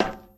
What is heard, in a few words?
metal, footsteps; Garry's Mod; hl2